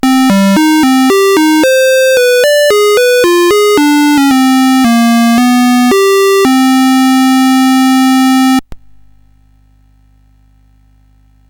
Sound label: Dragonworld sid CSG8580R5